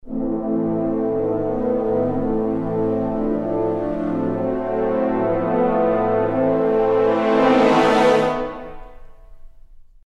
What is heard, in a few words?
action brass crescendo